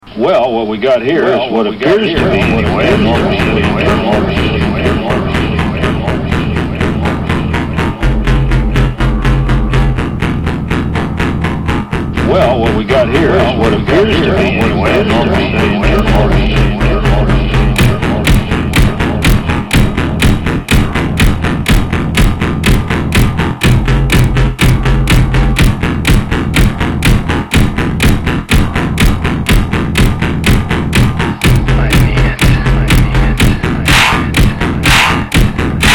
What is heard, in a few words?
savage organ